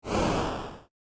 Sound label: sfx fwoosh; gae wild jam